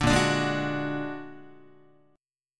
A sharp Augmented 7th A sharp Guitar; A#+7 strummed; JGuitar

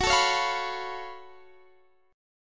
Gb Minor Major 11th Gb, guitar; strummed

